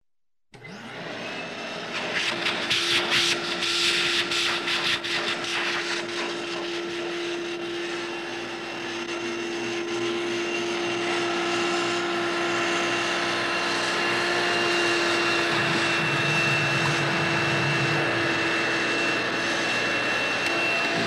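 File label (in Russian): звук пылесоса пуск и работа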